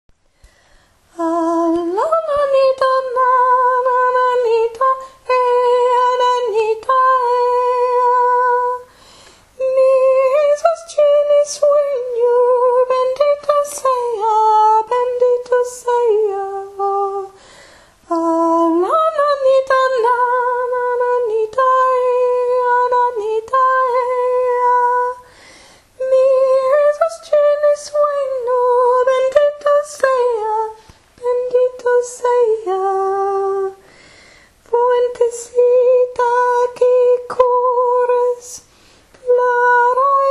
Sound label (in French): tenor chanté haut voix étranglée; a la nanita